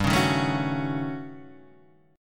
Eb 7th Sharp 9th Flat 5th, G

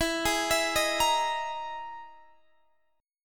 E Major 7th Flat 5th E down Guitar DADGAD

